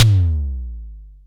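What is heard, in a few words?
SDS TM, tom; Best Service; Real Mega Drums VOL-1; SDS V KIT 2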